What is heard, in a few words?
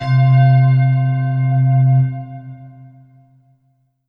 Korg M3R Cloud Bells C3